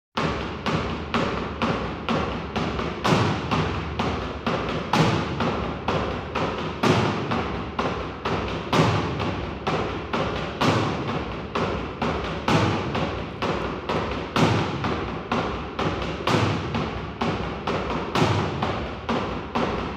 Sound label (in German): Drums Percussion Schneller Schlagzeugrhytmus